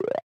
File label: Pick Up Health; Going Under